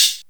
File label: soft high hat sound a sharp key, pedal hi-hat sample